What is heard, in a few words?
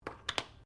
JAR GLASS LID PLASTIC PLACE BENCH; Various kitchen jars; Set Downs; Sound Effects:Objects; Foundation3